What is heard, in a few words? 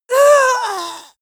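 female combat hit hard, screams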